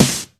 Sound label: Claps & Snares